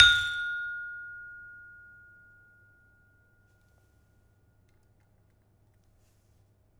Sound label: Peking hard, gamelan; gravityharps; timbrebox